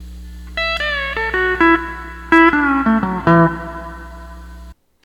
12 String Lap

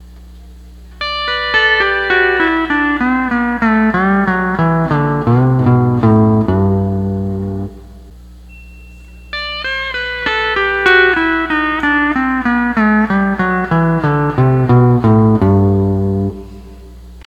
12 String Lap

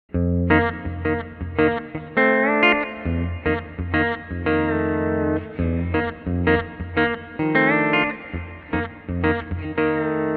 Axe FX3 Bottom Strings

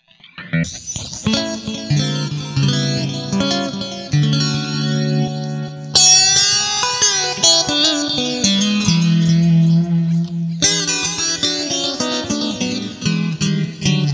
DEEP COMP, Boss GT-8

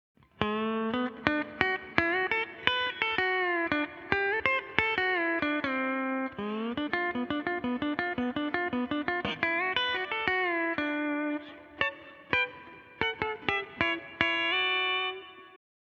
Compressor, AXE FX 3

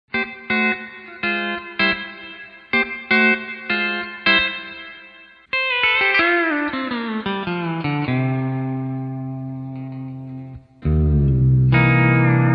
D13 Tab String 5 E9th Mode; D13 Copedant Tabs

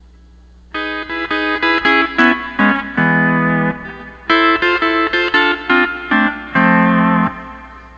12 String Lap

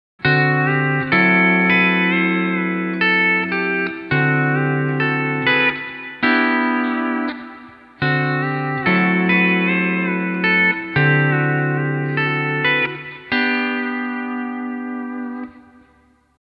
D13 Tab P6,RKR,LKV

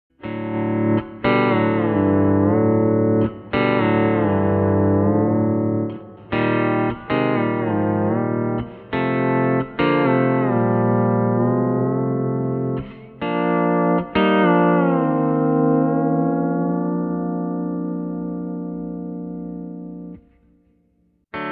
Split Pedal, Keyless; Excel D13 S12; Franklin

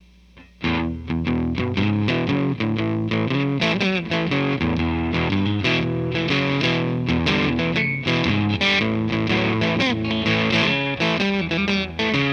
tele distort; Silkyn Tube Amp

